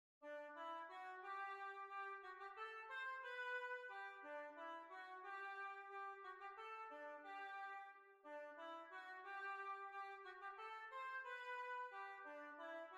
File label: Waltz